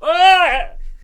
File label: Death Vocals